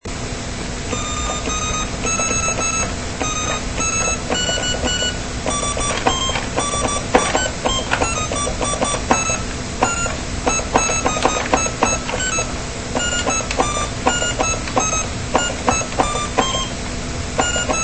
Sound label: guitar hack; shredz64